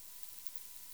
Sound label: Myotis nattereri, batcalls, europe